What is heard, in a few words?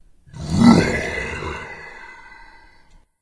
intro growl; smite; voicepack; sobek